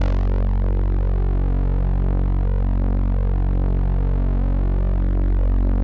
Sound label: 505, String Machines